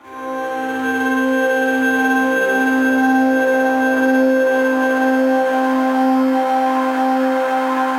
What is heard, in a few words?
Mover Drone, Pad; Instruments; Ableton; Drone Lab